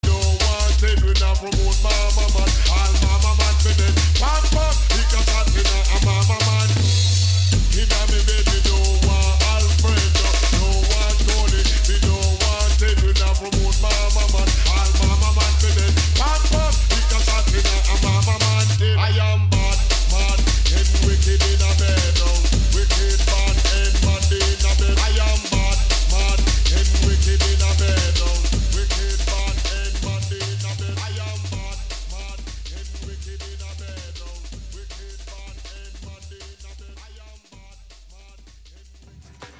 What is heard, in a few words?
drum and bass; RECORDED RADIO